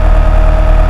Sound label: Engine Shift Rev